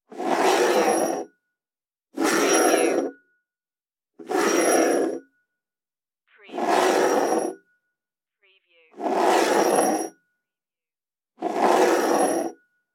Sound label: slide glass over table